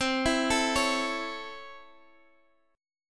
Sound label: A Major C; A/C arpeggiated